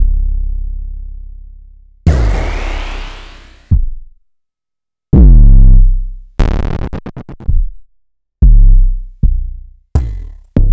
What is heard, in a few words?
Kick c